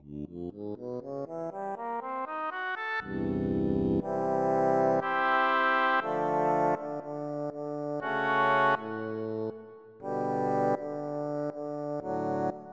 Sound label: Oboe; FF7